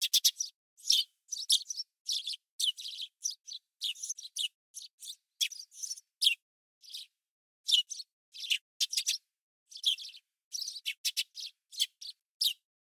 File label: passer montanus cry